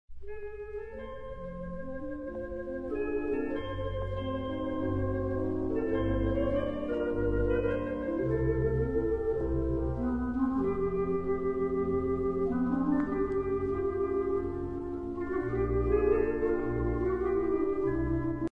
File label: theatre organ